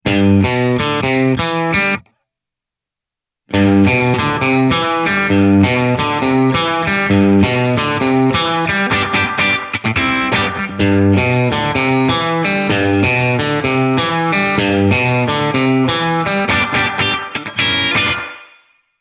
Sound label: Spring Bright Short